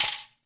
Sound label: shaker; Bunch of Shakers